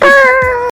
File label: bur noise; Burr